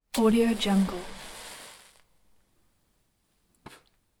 ignitable match; svetapavlovic

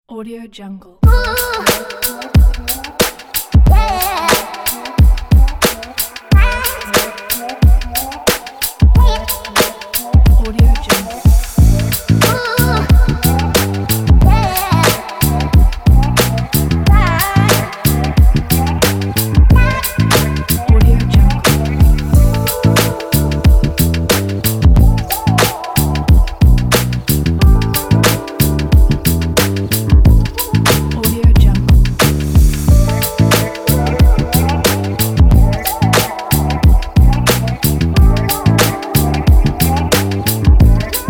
street hip hop

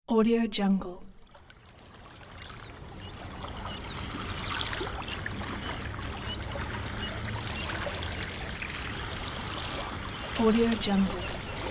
calm sea shore; worldwideaudio

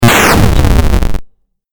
8bit block destroy explosion

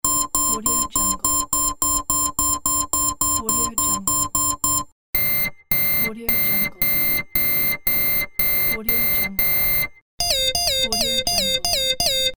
alarm

